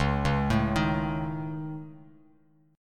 C sharp Suspended 2nd Sharp 5th C sharp down Bass Standard, C#sus2#5 chord; arpeggiated; JGuitar